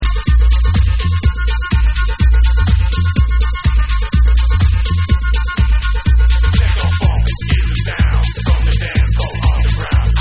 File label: TECHNO FUNK